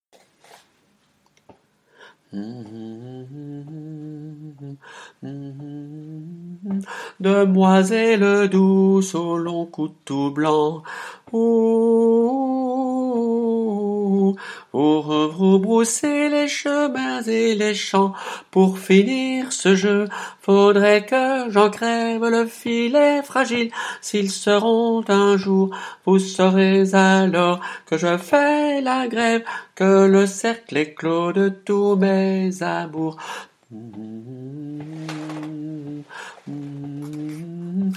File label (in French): Je chante sopranes; chorale